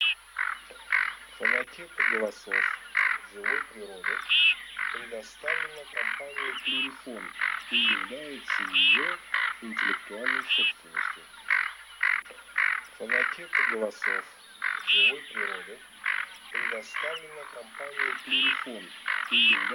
Гоголь Quattrocchi; plurifon

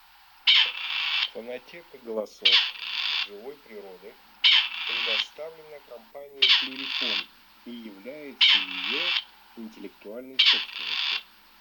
Куропатка серая Starna; plurifon

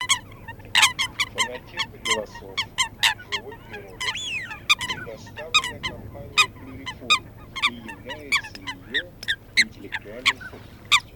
Лысуха Folaga; plurifon

